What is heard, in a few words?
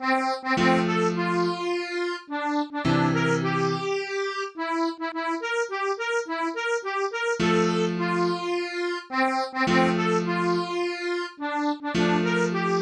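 MIDI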